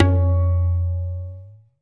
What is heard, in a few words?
long tabla hit; African and Eastern Percussion